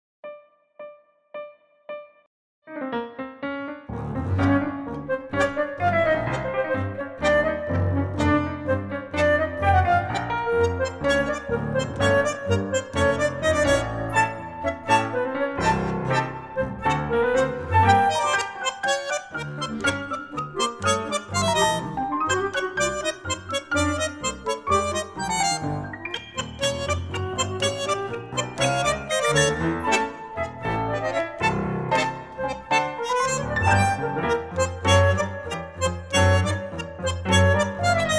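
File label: flute, G minor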